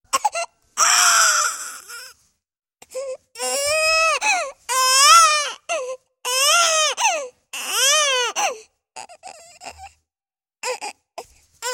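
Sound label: newborn crying sounds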